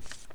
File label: berry drop